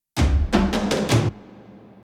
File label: Ambient caves transition; song of iron